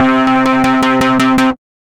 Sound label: Wobble Stutter, synth rhythmic; Instruments; Wavetable; Ableton; Factory Packs; Synth Essentials